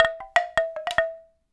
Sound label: Bamboo Wind Chimes; Percussion Samples